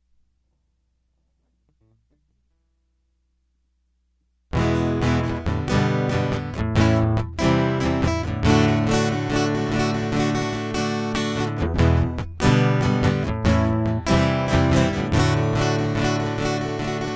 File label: Guitar Loop